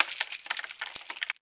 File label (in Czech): retizek dvere; Mafie 1